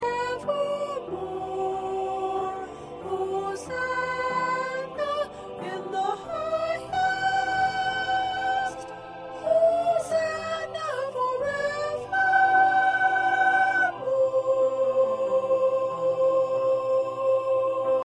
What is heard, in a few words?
1st Soprano